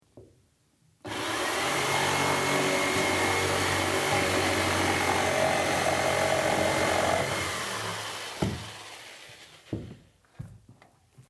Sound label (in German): Stichsaege